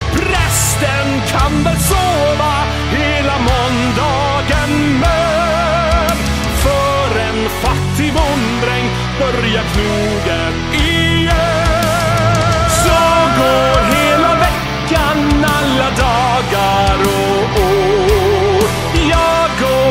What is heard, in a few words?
fattig bonddrang epic metal version